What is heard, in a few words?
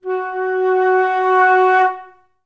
FL CRSC F#3, Advanced Orchestra; Best Service